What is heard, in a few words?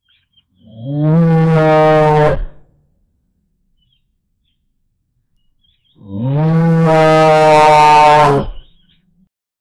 aggressive cow mooing; farm